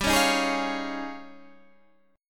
D sharp 11th G

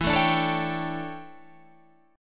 D Major 7th Suspended 2nd Suspended 4th

